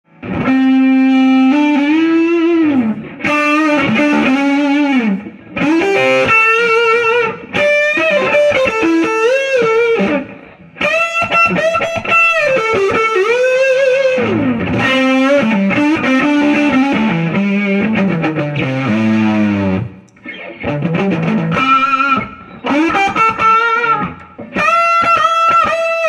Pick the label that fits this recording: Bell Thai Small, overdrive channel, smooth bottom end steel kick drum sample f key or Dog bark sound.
overdrive channel